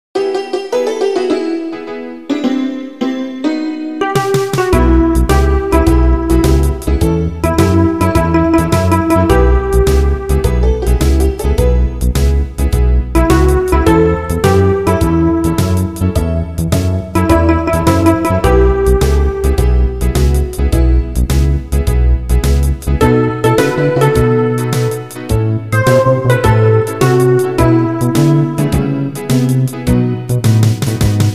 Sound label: blues